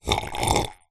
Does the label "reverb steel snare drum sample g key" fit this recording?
No